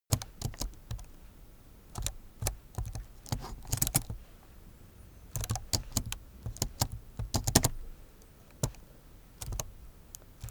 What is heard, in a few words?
keyboard laptop game sequence